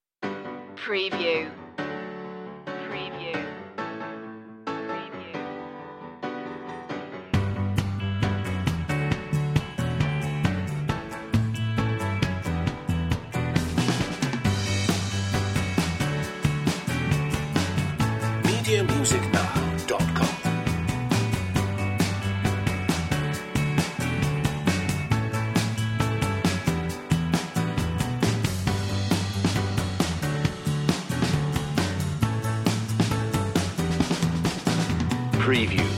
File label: pop underscore